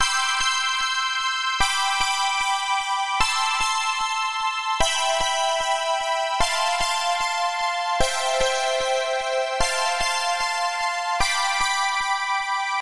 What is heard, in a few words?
Happy Strings